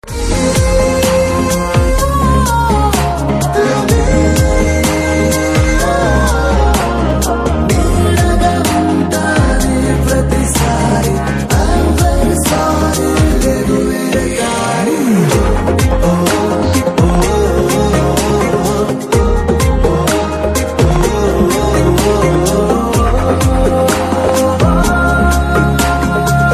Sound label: telugu tick tick love tone